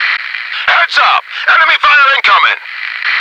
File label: noice